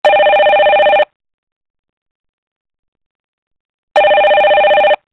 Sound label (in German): Klingelton